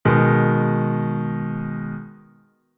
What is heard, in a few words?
G♯m, Chord Inversions